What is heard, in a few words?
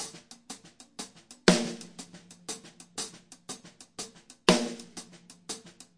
six eight loop, 100 to 105 bpm